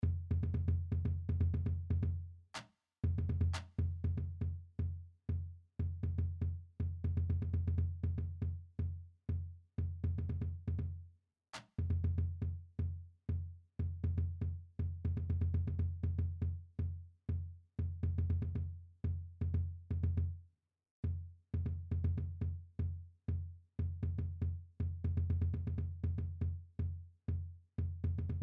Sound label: marching cadence bass drums; warrenton